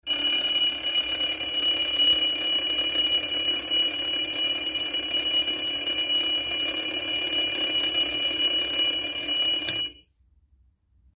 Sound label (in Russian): budilnik na stole